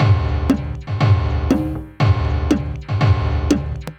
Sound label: Acoustic Guitar Hits; drum racks; Ableton; Factory Packs; Mood Reel